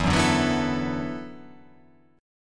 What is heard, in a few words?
E 7th Suspended 2nd Suspended 4th, down